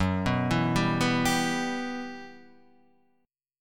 E Minor F sharp, chord; Em/F# Chord {2 2 2 0 0 3} arpeggiated

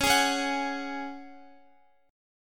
Db Suspended 4th Db, Guitar; strummed; x,x,11,13,13,13

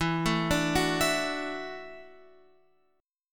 E 7th Suspended 2nd Suspended 4th Guitar Open F; E7sus2sus4; arpeggiated; x,11,9,9,9,11

